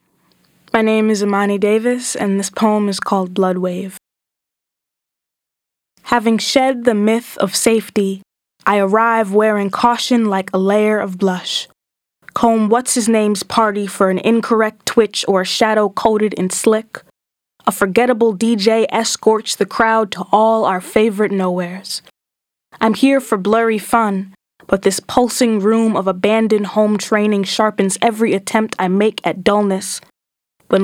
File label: acapella